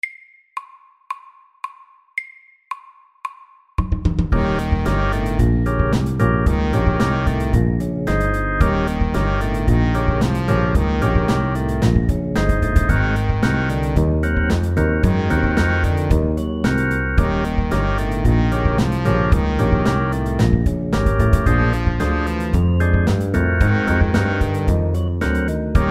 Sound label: 12 bar blues MEDIUM 112